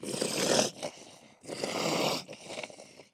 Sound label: zombie pig